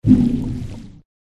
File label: Water Spirit Wound